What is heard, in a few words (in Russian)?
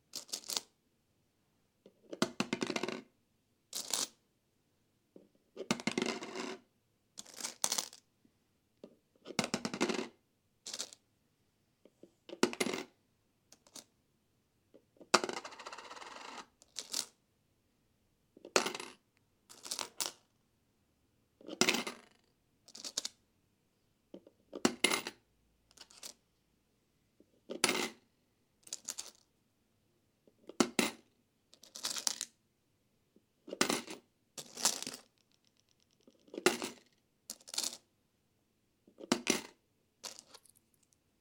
monety v kopilku